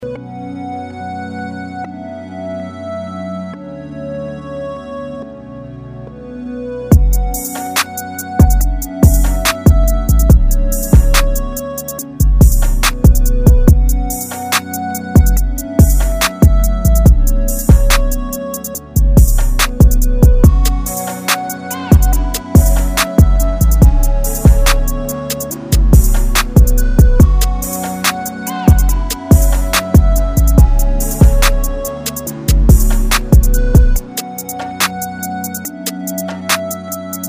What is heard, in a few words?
midi